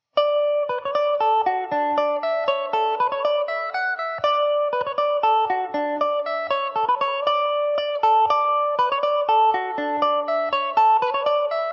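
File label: D major, Irish mandolin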